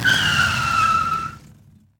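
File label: Break Squeal long, Monster Trucks; Nickelodeon - Destruction Truck Derby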